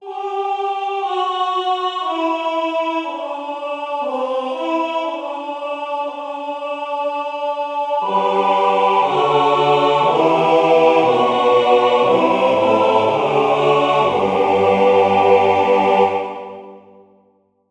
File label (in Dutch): 4 stemmig amen alt